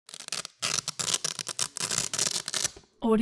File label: object household velcro undo roomy long